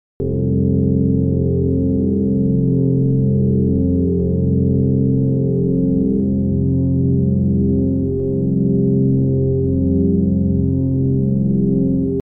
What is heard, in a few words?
dry drone